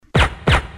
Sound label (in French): alerte silencieux